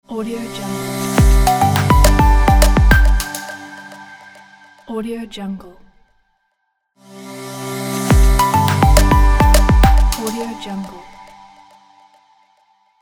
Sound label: audio logo minimal